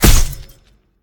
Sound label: projectile hit; smite; tiamat